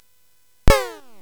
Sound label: Dark Castle, CSG8580R5